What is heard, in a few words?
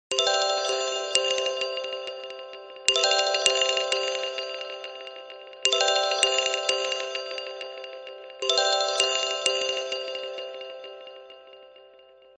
nokia lumia ringtone